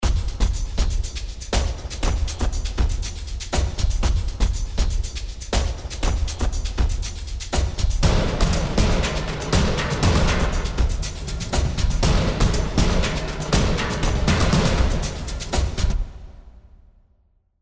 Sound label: action percussion